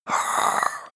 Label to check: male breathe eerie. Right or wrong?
Right